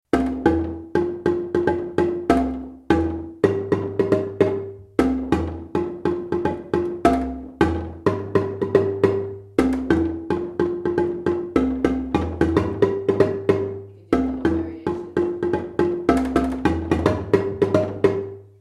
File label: bass low line, marimba